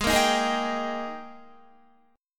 Ab Major 7th Suspended 2nd Ab down Guitar; strummed; JGuitar

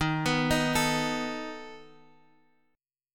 A Suspended 2nd Flat 5th D sharp, guitar

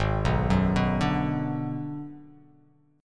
Ab Minor 7th Flat 5th Ab, down; arpeggiated; JGuitar

